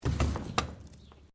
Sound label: Bricks Metal Movement; Material components for constructing more complex sounds